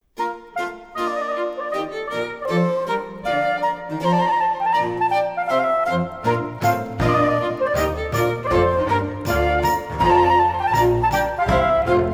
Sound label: Dance Mix Gavotte